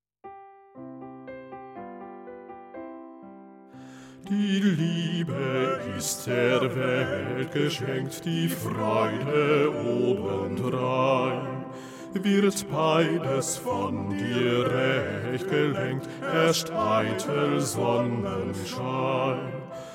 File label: TTBB Bass2; maenner